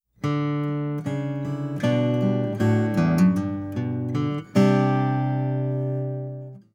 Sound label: jingle begin